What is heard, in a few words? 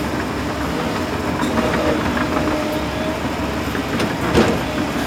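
tractor ambience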